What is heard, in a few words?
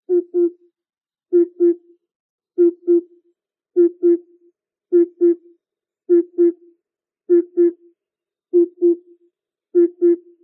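Oriental cuckoo song, birds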